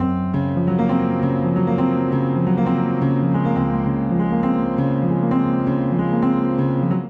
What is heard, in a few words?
Progression IVmaj7#11 - Imaj7 135bpm Gb major, Upright Piano; MIDI Clips; Fall Rises; Upright Piano by Spitfire Audio; Ableton; Factory Packs